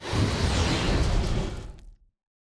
atlas dash slide; Titanfall 2

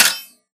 bullet light ballistic blades deflection

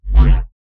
Movement Jog; Titanfall 2

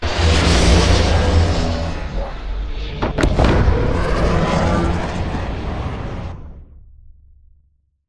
strafe; Beacon; Titanfall 2

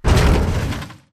bullet explosive titan shudder; Titanfall 2

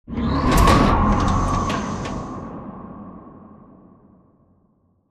Giant Floor Panel Exit On Track Start; Boomtown; Sideways Town; Titanfall 2

